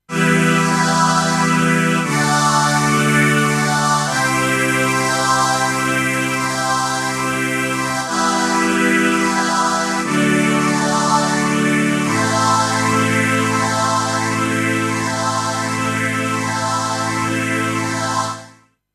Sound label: Vintage Stringer Stereo Phaser